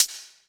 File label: Open Hihat; Vengeance Samples; VEE Cymbals